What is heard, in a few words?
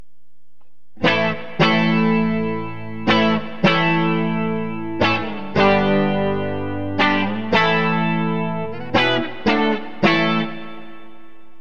sdcsgold bridge clean, burny fugly clips